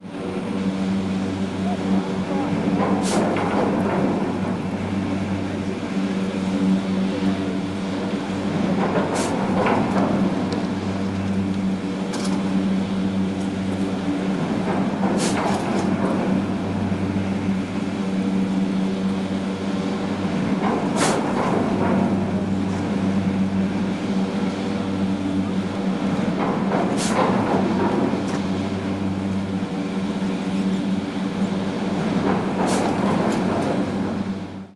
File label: chairlift lift walla machine in operation ski snowboard moving; fvtt sonniss gdc audio